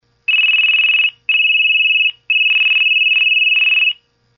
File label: Loud Beeps; V710 Ringer